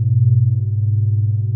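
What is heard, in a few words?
Ambience Sci-fi Energy Loop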